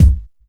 phat kick drum g sharp key, steel kick drum sample tuned to the G# note